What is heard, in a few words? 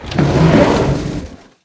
furniture slide; estate; Call of Duty Modern Warfare